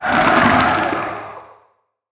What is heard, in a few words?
Zombie Throat, Monster Sounds; TheDeclineOfWarriors; Monster Sounds Volume II